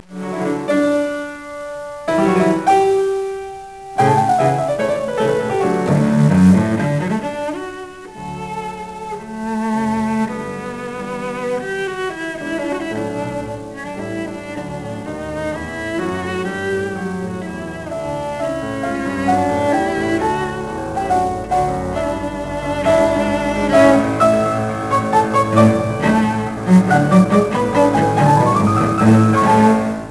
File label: Sonata, cello; Beethoven Sonata